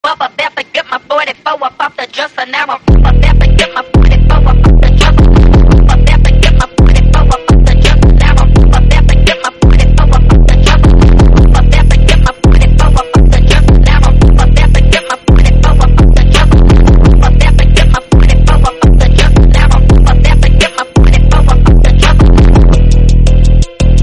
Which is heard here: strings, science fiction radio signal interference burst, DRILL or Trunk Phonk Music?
Trunk Phonk Music